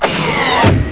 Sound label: TUBE; DOOMWADS